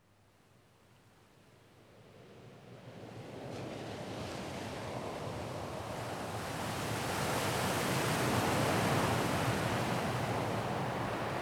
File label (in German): Meditatives Fliessen